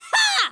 spider

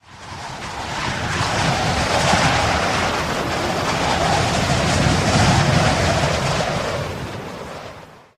high altitude; OneShot Fading Memory

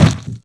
rabbit on ground; Rabbit-Wars

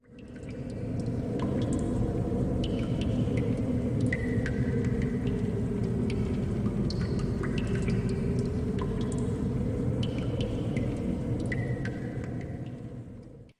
drops, Mines; AMB; OneShot Fading Memory

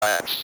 glass

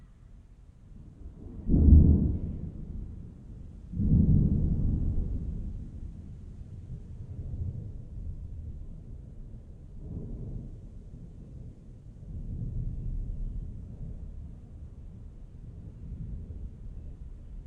thunder

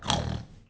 piglette oink

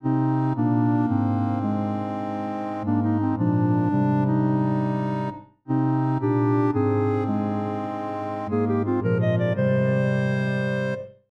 170BPM Pad

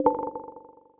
mail open

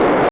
rocket fly; Rabbit-Wars

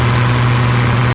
tank move; Rabbit-Wars

